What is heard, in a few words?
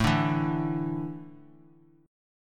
A Suspended 4th; Asus4 strummed; JGuitar